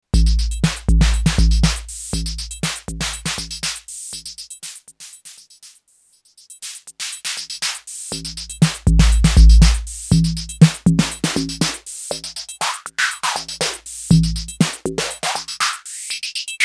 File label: Filter HP; Blck Noir